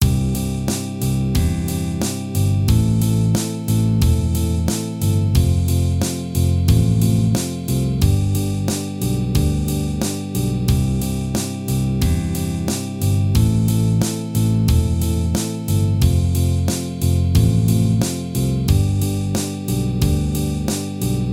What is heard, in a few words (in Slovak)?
pomaly rock gitara